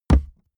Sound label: step walk wood plank; schritte